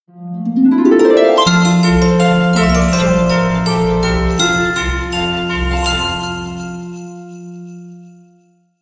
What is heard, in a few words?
on christmas ident